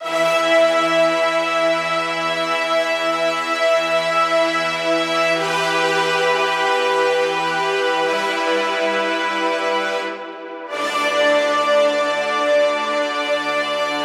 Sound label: Horns Em 90BPM; STEMS; Ghosthack; SkyRealm; Mood Kits; Mood Kit 05 - Hands Of Time